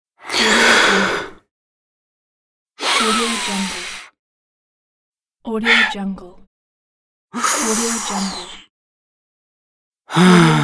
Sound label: breath